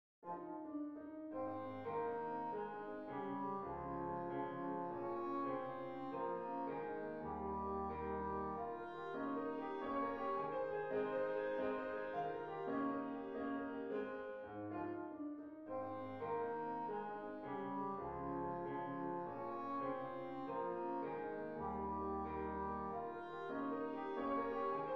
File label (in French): valse; beethoven ludwig van